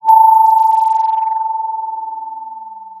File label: Sound of a phone ringing